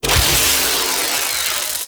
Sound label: Electricity Shock Burst Fire; Warframe; Corpus; Oxium Osprey